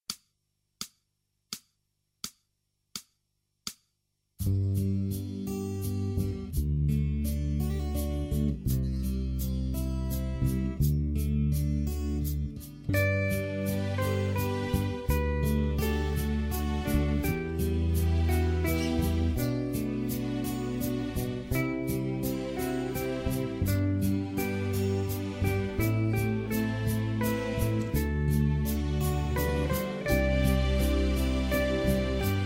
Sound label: waltz